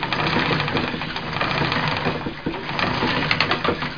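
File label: spinning wheel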